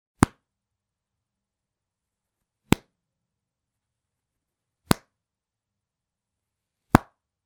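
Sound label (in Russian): звук резинки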